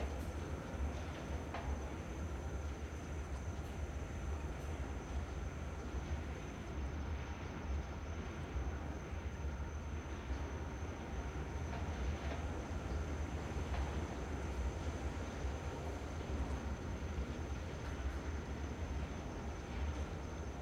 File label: amb zaun